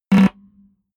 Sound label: button, signale; soundpool